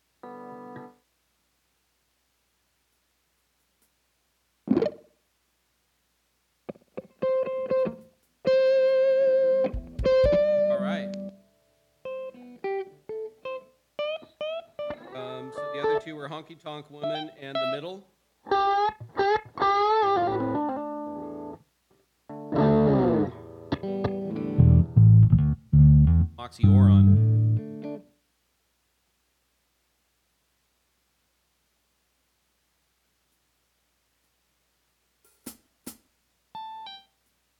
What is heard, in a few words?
Strings